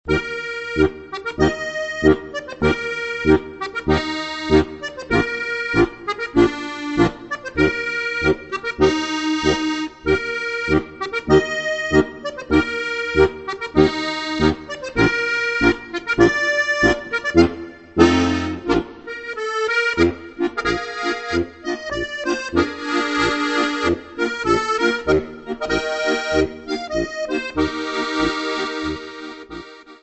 marsch; Leicht & Guat - 15 leichte Märsche

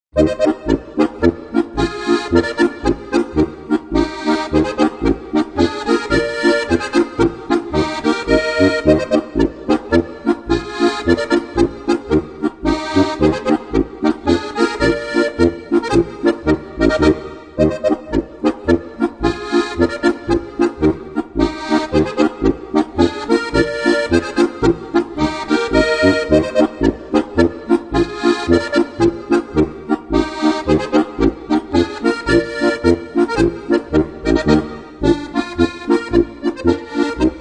polka, traditionelle Volksmusik

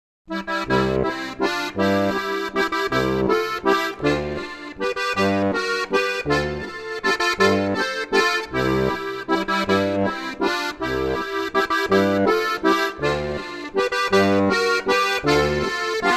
walzer

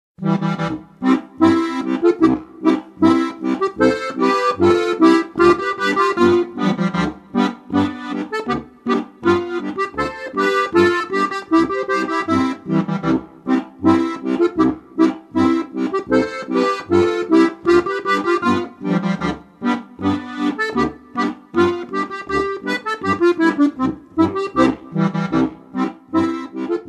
boarischer

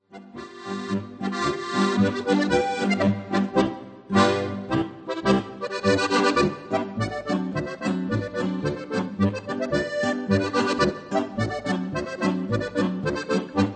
pongauer bauern polka

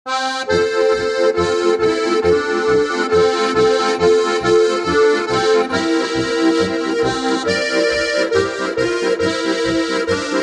polka, Harmonika; Auf meiner Harmonika - Band 2

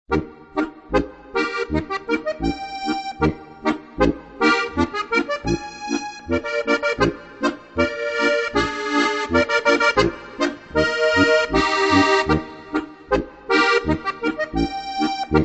boarischer; Leicht & Guat - 15 leichte Boarische

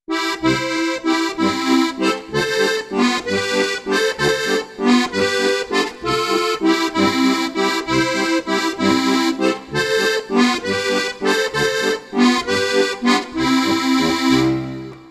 walzer

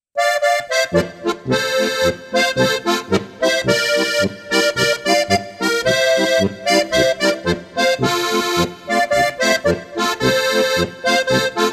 polka

